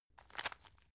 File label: tissue package pick up